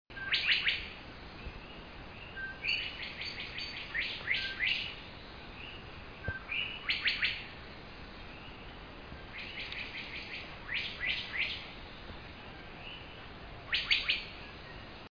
loxigilla portoricensis maricao state forest puerto rico; West Indian